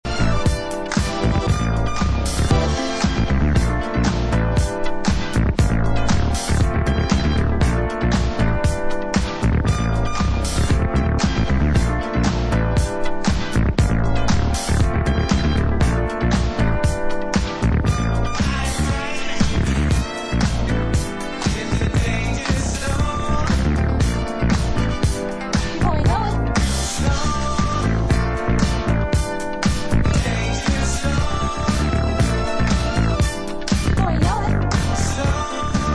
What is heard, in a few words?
Instrumental; Funk